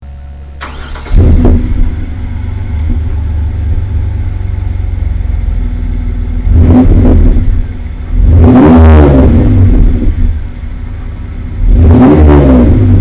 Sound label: Borla Closed Baffle, Camaro